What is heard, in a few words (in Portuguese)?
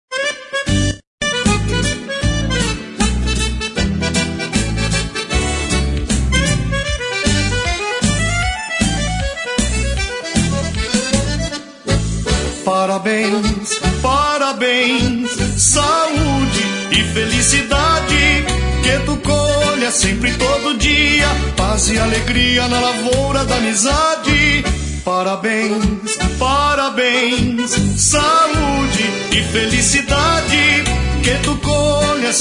Parabens Crioulo; Comemorativas; Aniversario